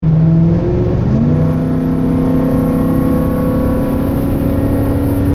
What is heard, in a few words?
fx4 different whistle; x4 different whistle help